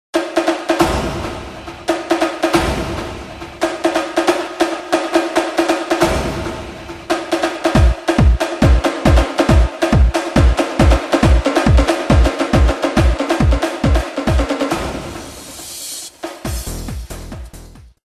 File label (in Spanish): sonido tambores